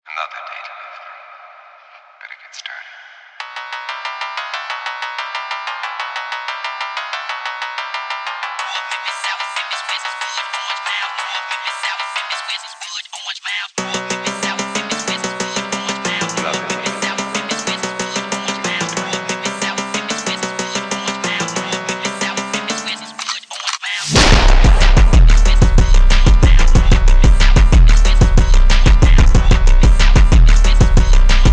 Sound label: DRIFT PHONK MEMPHIS TYPE BEAT